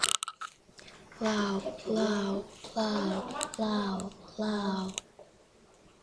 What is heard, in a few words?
laugh